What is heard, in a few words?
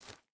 footstep burlap stones jump start; Rust